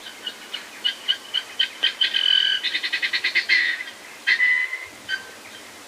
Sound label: Birds Various